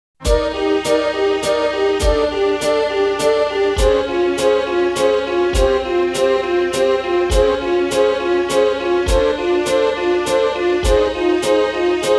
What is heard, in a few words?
Losing Jingle; mario tennis 64 original sound track